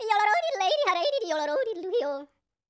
YODEL, World Voices; ILIO; Vocal Planet

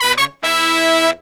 Horns, 120BPM

